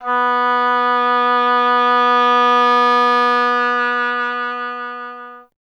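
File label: Wind OBOE B; Miroslav Vitous - Solo Instruments VOL-2; Solo lnst. II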